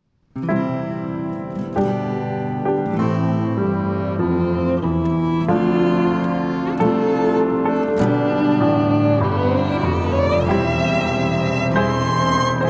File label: o canada instrumental